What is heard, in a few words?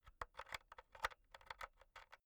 insert usb b rattle, elektronik; objekte